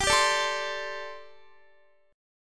G Suspended 2nd Suspended 4th G, Guitar, 4F, 4A, 5C, 5G, 5C, 6E; strummed